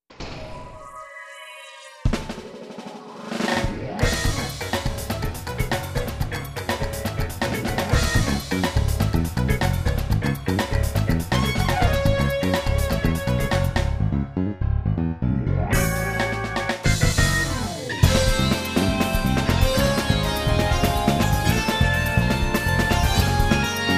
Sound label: synth